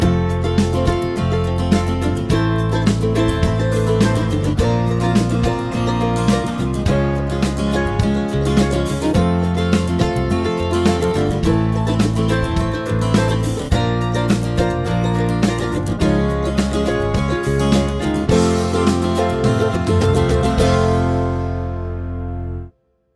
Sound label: chord prog with mixture